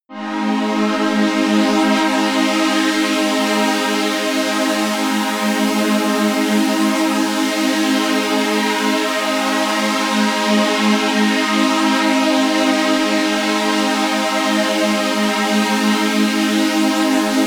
running energy, music elements

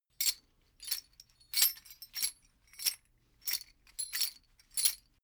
metal chain movement sequence

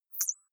click synthetic light, signale

